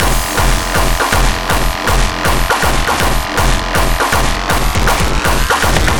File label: Beats Loop; Anger Foot